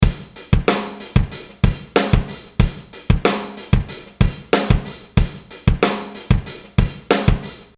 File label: bypass drum